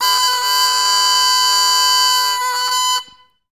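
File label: english horn; Best Service; Peter Siedlaczek's Advanced Orchestra; EH FX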